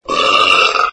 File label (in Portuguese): Arroto, Vinheta